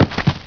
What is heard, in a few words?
dive land